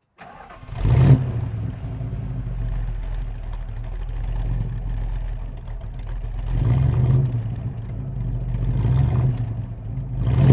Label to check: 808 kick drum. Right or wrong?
Wrong